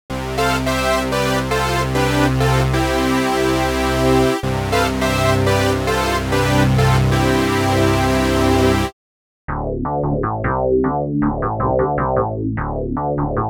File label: vintage obxa